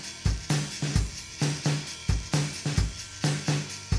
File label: drums; musdb18